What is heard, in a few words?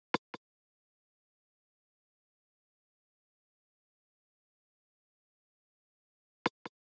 Drum Loop 152 BPM Percussion; Cymatics; Vibes II Drum Loop Collection; For You Drum Loop